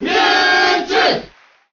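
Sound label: Cheer Japanese; Mewtwo; Melee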